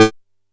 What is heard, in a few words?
A3, Bass; E-MU Producer Series Vol. 6 – Analogia Project; Boom da Bass